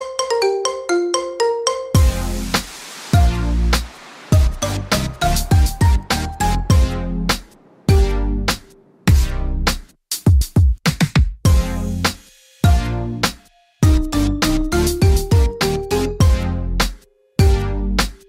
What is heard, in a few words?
marimba remix